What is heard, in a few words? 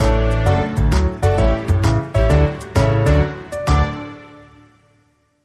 Bumper